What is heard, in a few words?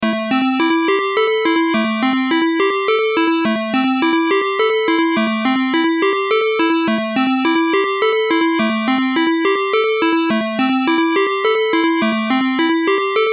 RINGTONE